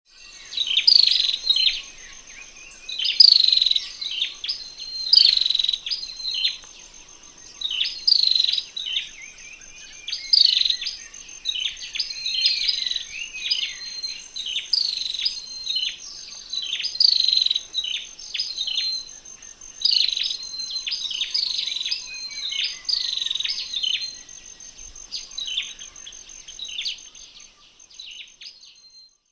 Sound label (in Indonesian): suara panggilan prenjak kepala merah